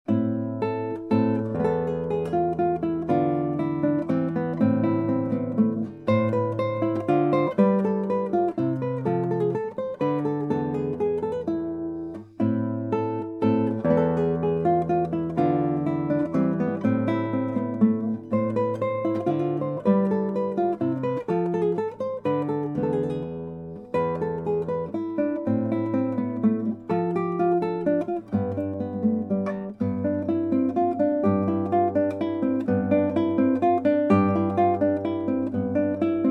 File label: menuet; slweiss